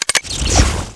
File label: grenade fragment saint; night watch